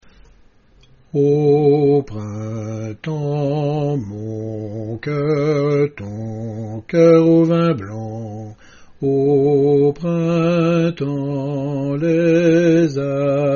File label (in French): hommes; au printemps; Tenor